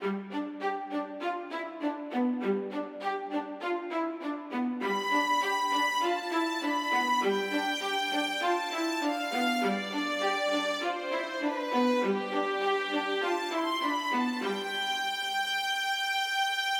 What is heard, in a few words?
sustain staccart